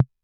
tom; MFB-522

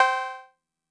cowbell; MFB-522

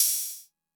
hat; MFB-522

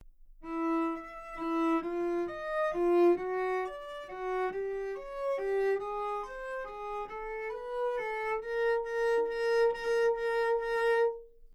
cello E4 phrase mezzo-forte arco-legato; solo instruments

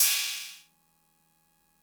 cymbal; MFB-522

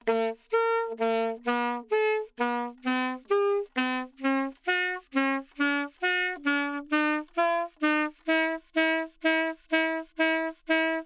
saxophone A3 phrase mezzo-forte nonlegato; solo instruments

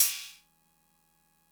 cymbal; MFB-522

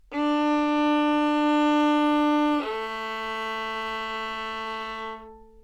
Two Note, violin

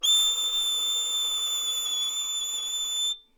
violin

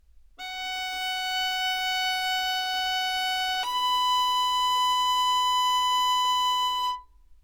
Two Note, violin

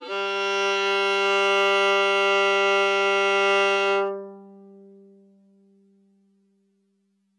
TONAL, Sound Synthesis; Sound Synthesis Introduction

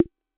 snare; MFB-522

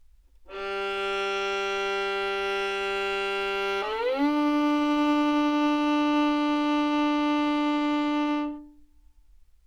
Two Note, violin

